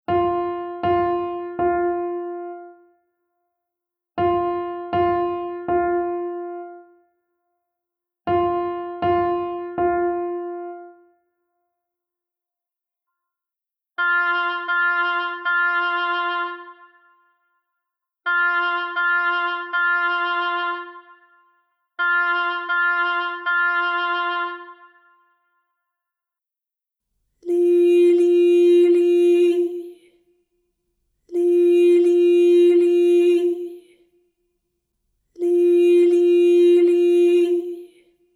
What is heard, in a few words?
Patterns of three notes the same